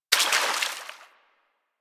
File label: Bullet Impact Debris Water